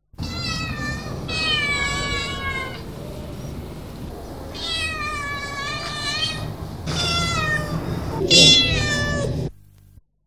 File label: Cats; SOUND EFFECTS1